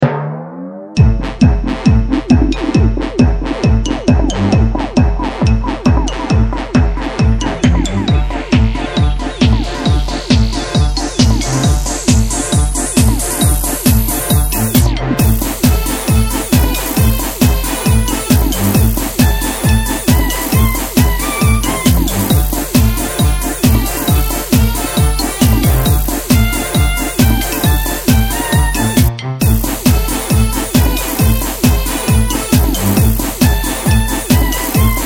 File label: Party loop